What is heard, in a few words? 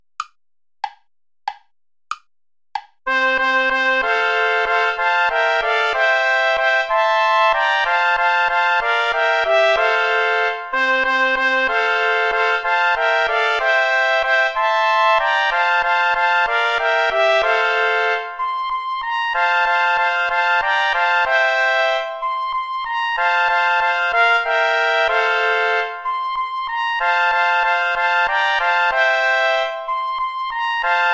play along without trumpet; asikhatali; traditional